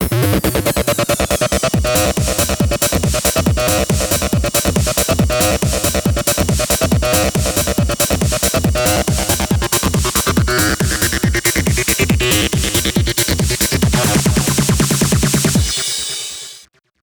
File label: Acid Ring